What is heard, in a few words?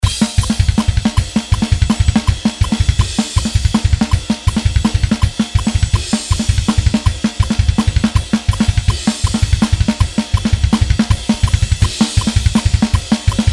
fast drums before